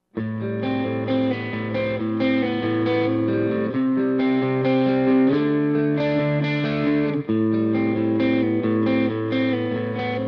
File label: Rock single mid